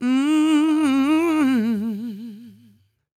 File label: CROON, Soul